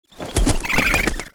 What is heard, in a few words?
THORNASH WAR ATTACK